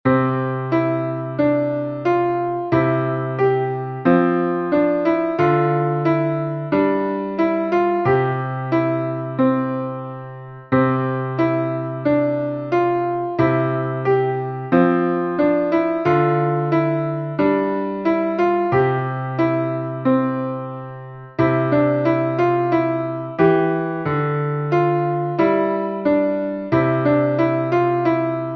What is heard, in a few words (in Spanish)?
Piano Fácil principiantes; Sonatina Estudio de Piano